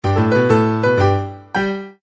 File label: vaudeville seven note fanfare; Song Ending